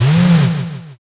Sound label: servo